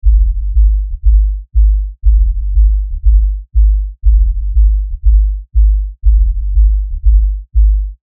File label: drum bass High Cut